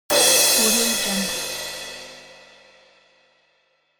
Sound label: cymbals crash; danielmix